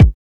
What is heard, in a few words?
wet kick drum sample a sharp key, Royality free bass drum one shot tuned to the A# note.; kick drum samples